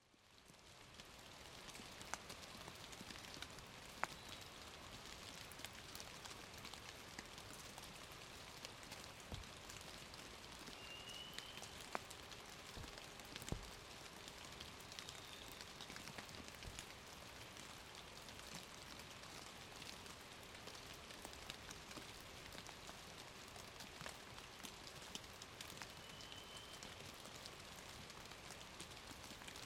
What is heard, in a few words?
bird